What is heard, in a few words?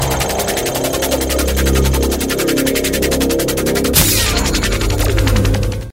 Pulse